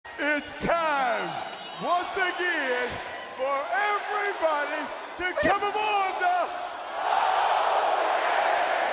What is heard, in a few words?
ho train